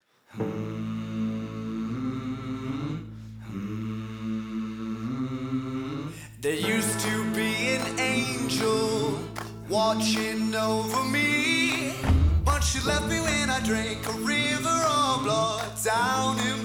Bells; sxsw

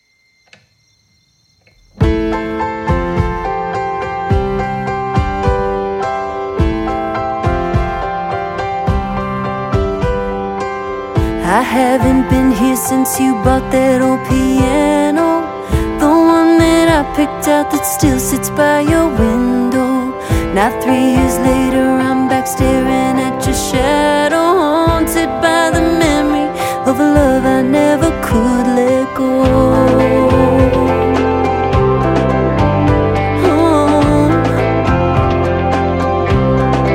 Old Piano

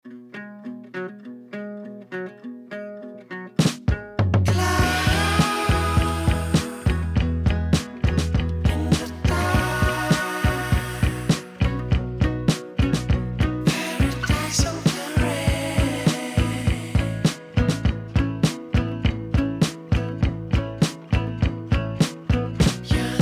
Heartbeat

